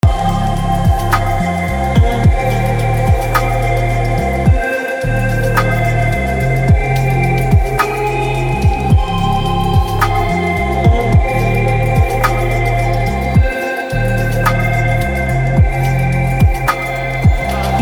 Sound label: echo looped; Echo from the past